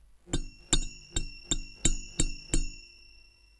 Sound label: microphony